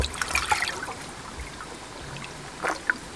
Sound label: Oncorhynchus tshawytscha Surface Air Movement